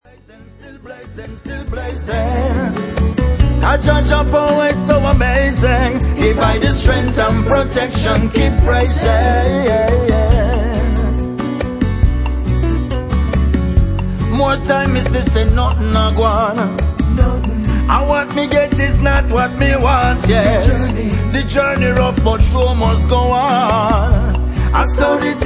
Acoustic

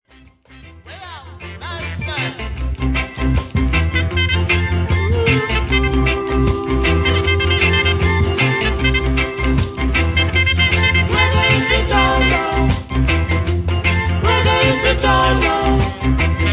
Reggae

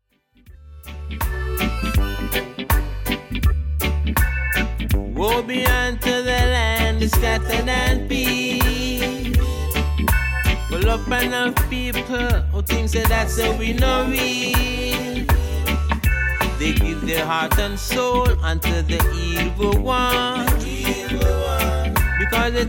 Organ

